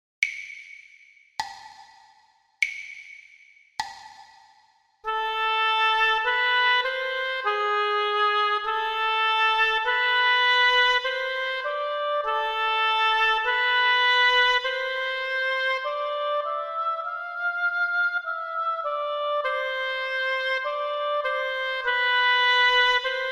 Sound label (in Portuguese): percepcao ditado